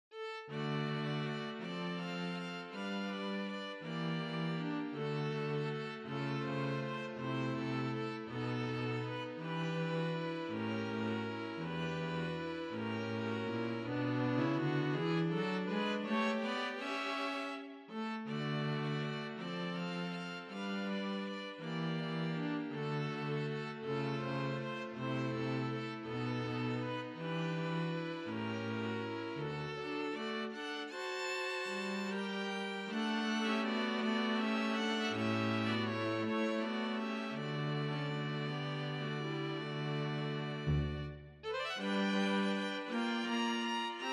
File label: Blues